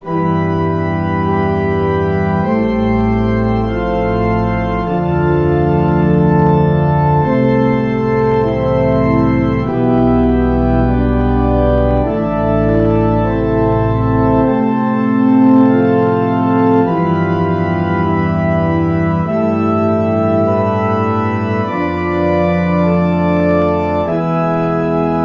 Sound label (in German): Stimmiger Contrapunkt; Ronge